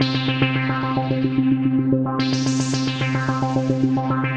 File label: Fuzz Jen A 110 C, modular 110bpm